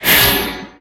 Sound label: Pickups Powerups; unreal development kit